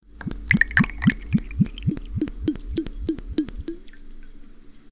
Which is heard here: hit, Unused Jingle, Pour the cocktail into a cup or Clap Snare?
Pour the cocktail into a cup